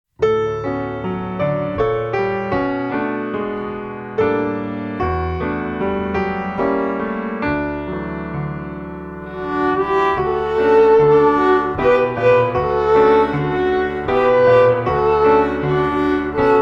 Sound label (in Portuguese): Meu Limгo, Meu Limoeiro (Instrumental); Violino para os Pequeninos